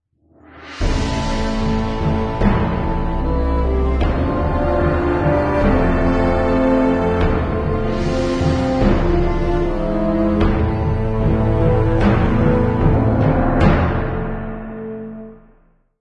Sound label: bgm mission fail